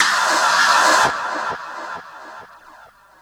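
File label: scratch, record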